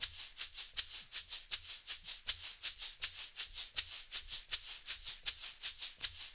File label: LOOP, SHAKERS, Percussion